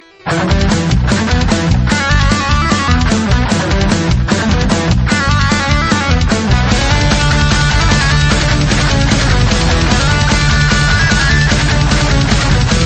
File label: Metal; Angry Birds